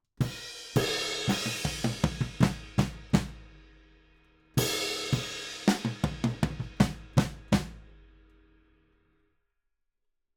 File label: Ride